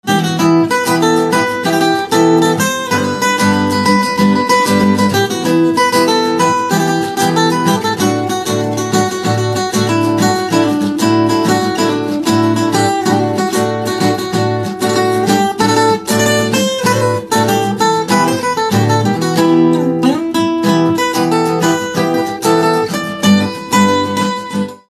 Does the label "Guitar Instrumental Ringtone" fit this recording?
Yes